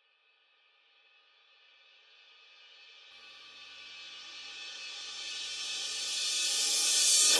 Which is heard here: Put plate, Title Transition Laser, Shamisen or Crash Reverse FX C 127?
Crash Reverse FX C 127